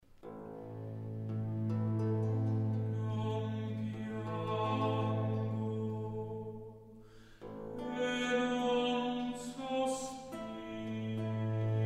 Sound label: Dialogue in recitative; Por quel vago boschetto; from le musiche sopra l'euridice; Jacopo Peri